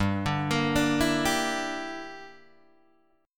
G Minor 6th, Guitar Open D

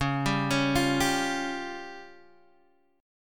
Db Major Flat 5th, Guitar Standard; arpeggiated; JGuitar

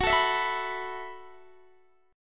F sharp Minor 11th, Guitar, Open D

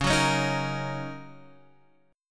Gb 6th Db

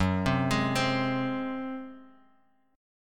F sharp Suspended 2nd Flat 5th, Guitar Drop D; chordshape; down

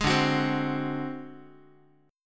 D 7th Flat 5th, Guitar; strummed; 11,10,9,10,x,x; 4A,4E,5A,5D,5G#,6C#; chord

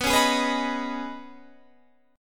Db 7th Suspended 2nd

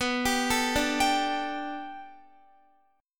B Minor 7th Sharp 5th B Guitar, chord; Bm7#5 arpeggiated

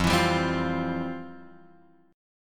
F Major 7th Suspended 2nd, F, guitar; FM7sus2 Chord {1 X 2 0 1 1} strummed

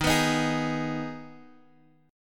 Eb Suspended 4th Sharp 5th, Guitar Standard; Ebsus4#5 strummed; 11,14,13,13,x,x; JGuitar

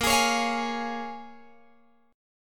Bb Minor 7th Bb, Guitar, Open G

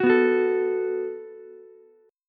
F sharp Minor Double Flat 5th F sharp, Ukulele; strummed

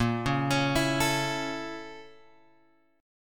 A sharp Minor Major 7th Flat 5th A sharp; A#mM7b5 Chord {6 4 X 6 5 5} arpeggiated

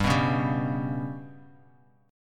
G Major Flat 5th Bass Standard, chord; GMb5 strummed; JGuitar

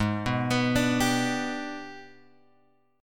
G sharp Minor Major 7th Flat 5th, chord; G#mM7b5 Chord {4 2 X 4 3 3} arpeggiated

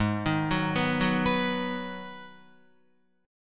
Ab Minor 7th down Guitar; arpeggiated; JGuitar

